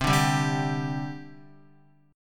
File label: C Suspended 2nd, C, down, Guitar; Csus2 strummed